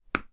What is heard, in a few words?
sport tennis ball catch in hand